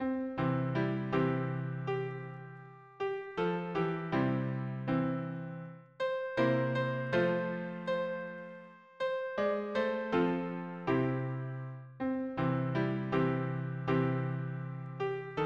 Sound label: harmony piano